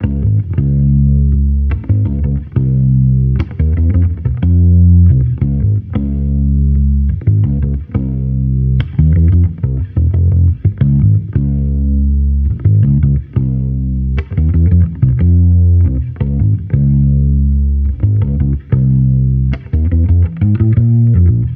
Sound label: Amped loop 89 Fm, Electric Bass Loops; Slate Digital BASSHOLE Sample Pack